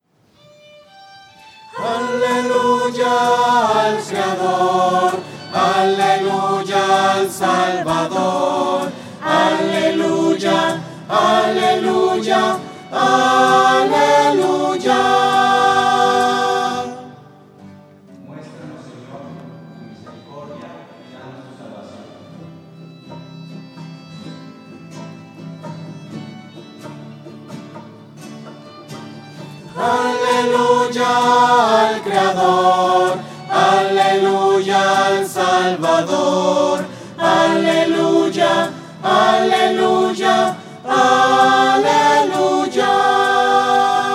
aleluya al creador voces; misas 2012

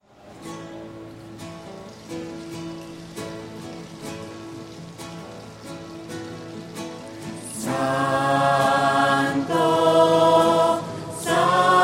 santo pacto voces

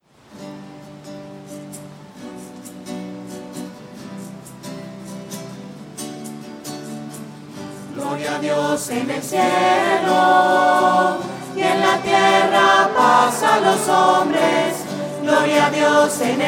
gloria voces; misas 2012

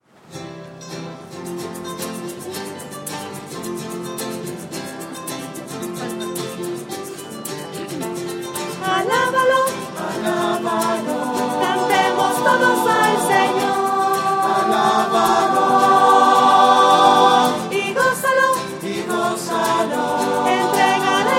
alábalo voces

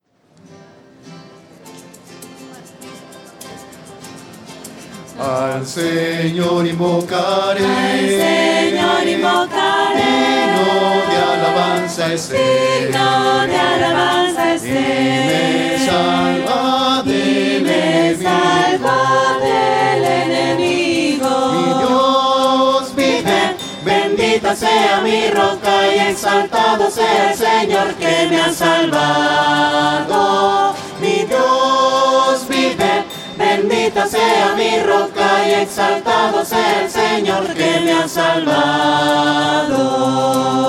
voces; al sennor invocar; misa

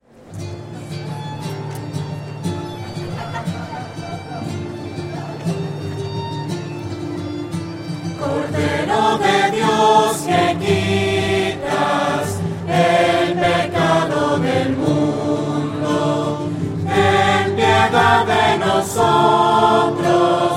cordero de dios voces; misas 2012

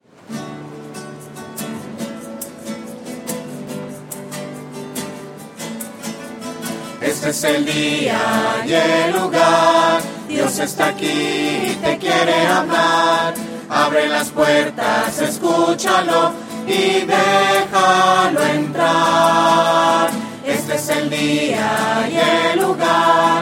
voces; alabanzas; misas 2012

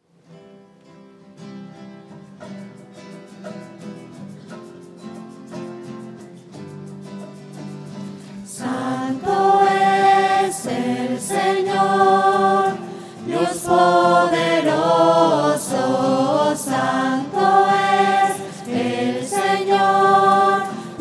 santo shuwa voces; misa

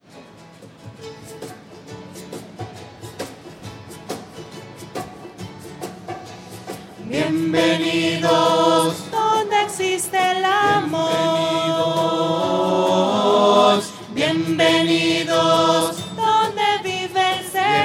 bienvenidos voces; misa

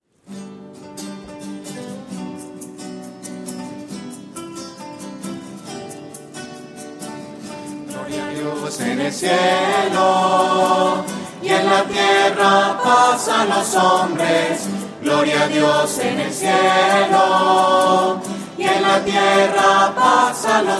gloria voces; misas 2012

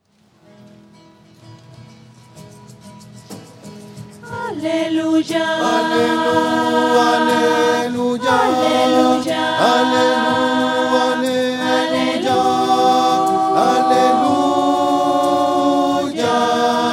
voces; aleluya en mi; misa

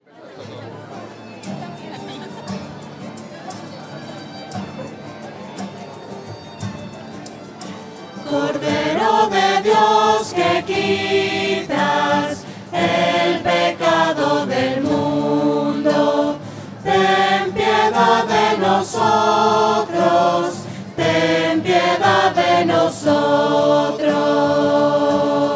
cordero de dios voces; misa

